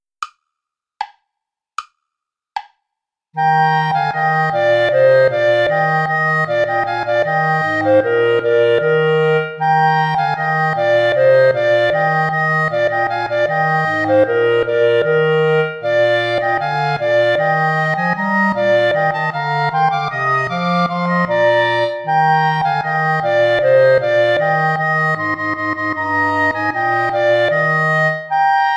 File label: play along without clarinet; traditional